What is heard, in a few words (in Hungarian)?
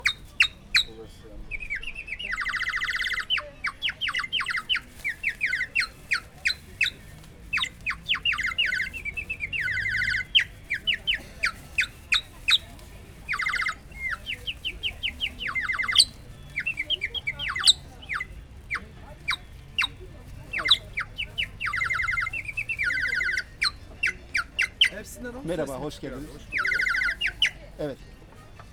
kerekesszekes arus madarfutty tilinko, poloska